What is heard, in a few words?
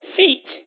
foot